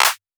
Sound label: Clap; Drum One Shots